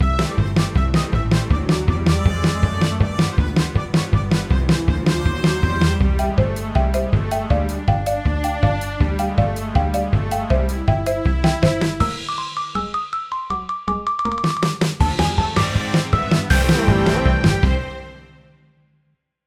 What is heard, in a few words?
midi; looney toons